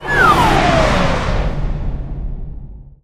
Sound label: Fall; SE Manzai#18 (SE126 Man Fall)